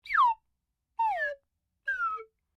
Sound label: Whistle Slide Down Sequnce, Simple slide whistle